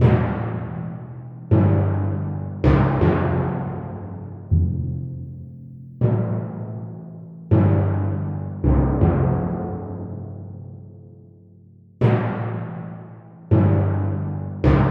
timpani; драма

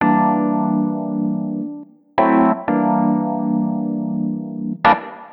EP, piano

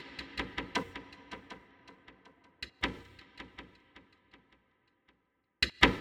Cellular, ambient

